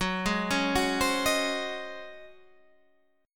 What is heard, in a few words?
C sharp Major 7th Suspended 2nd Suspended 4th, F sharp